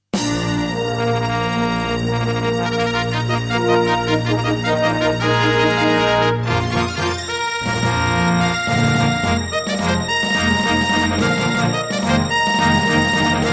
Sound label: Intro, NEC XR385 (DB60XG Clone) GSMODE; Dark Forces